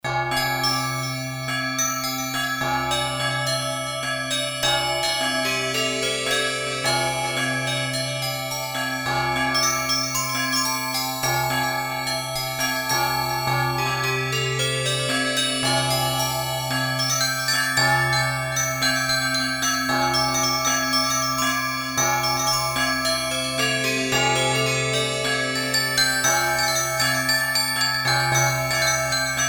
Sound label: Java, Ethnic Perc, Korg DSS-1